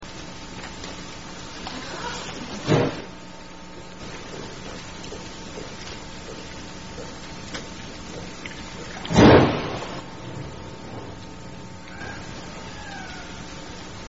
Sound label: bangs, transient killer whales; salish sea